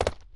jump, footsteps hard surface; grunt footsteps

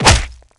melee group, assault rifle melee hit

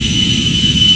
plane engine; Enemy SE; Nintendo; July 2020 Gigaleak

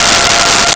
Trumpet F5; Nintendo; July 2020 Gigaleak

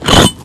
ice crash; Nintendo; July 2020 Gigaleak

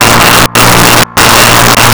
Cello Bb1; Nintendo; July 2020 Gigaleak

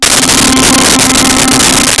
Sweep Pad C5; Nintendo; July 2020 Gigaleak

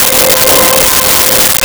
Violin C5; Nintendo; July 2020 Gigaleak

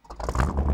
warthog, in; warthog right; hotrod; vehicles

